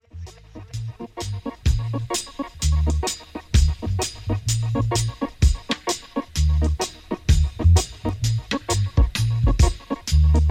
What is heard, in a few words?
groove, Reggae Roots Dub